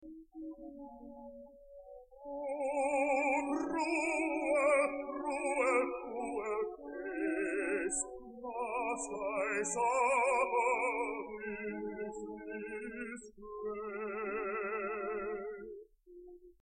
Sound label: air